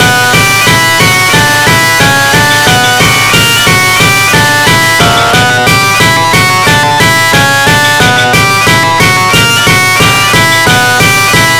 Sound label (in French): alarme incendie; jeux interdits 444